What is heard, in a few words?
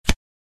Dealing playing cards